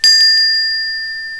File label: bell